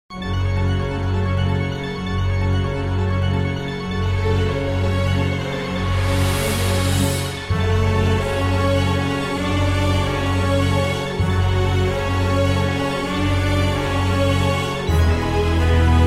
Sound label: epic opening